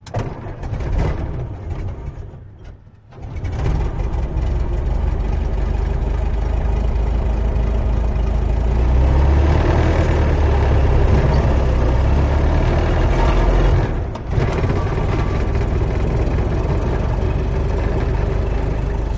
Engine Start, Revs., With Some Creaks And Clanks, Driving And Acceleration. Various Rumbles With Low Engine Idle And Chugging Off. Close-up, Interior., Model T; Ford Model T